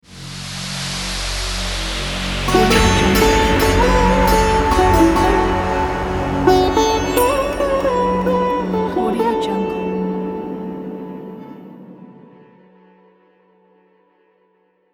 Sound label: indian dark logo